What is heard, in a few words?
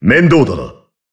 Low Hit; BBCF; Susanoo